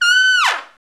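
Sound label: Trumpet Fall, Roland; Club-50 - Foundations Roland; Trumpets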